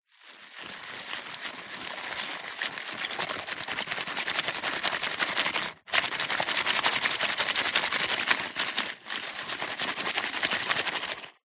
industrial panning gold swirl stones dirt water plastic pan